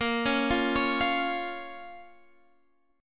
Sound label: A sharp Minor A sharp, Guitar, Standard; 18,16,15,18,18,x; arpeggiated; JGuitar